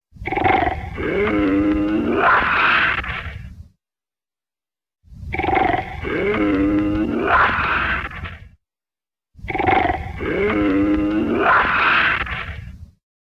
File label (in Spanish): rugido